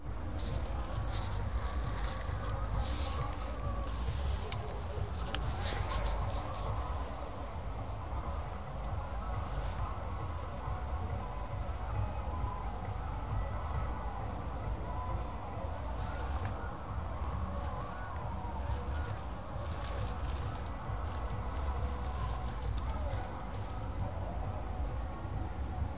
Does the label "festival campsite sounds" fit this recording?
Yes